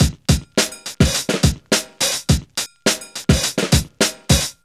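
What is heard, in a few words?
105 bpm, Funky loops; Zero-G; Funky Elements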